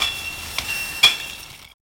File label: mining ore; industry